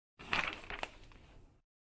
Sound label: ebook page; oneplus